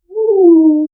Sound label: fading mournful howl; dissipating